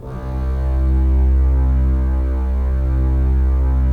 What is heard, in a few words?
STR, Roland LA Composer